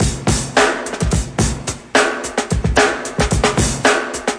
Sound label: 109 painfull snare funk, drum loops and breaks; 101 to 110 bpm